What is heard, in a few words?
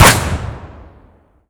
blowup, Flashbang; Weapons; WarRock